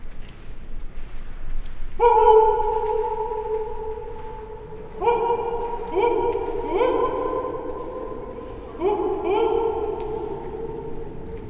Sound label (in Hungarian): dionuszosz fule visszhang